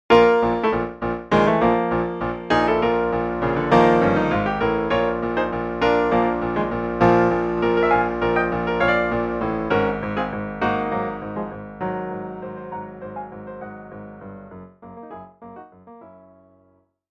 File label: Rehearsel Piano